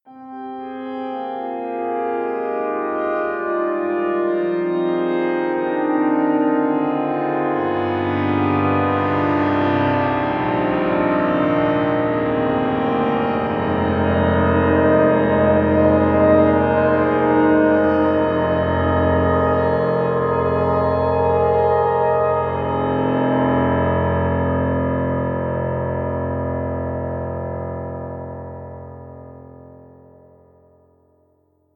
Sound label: Pearl concert grand, pad nostalgia; IMPACT SOUNDWORKS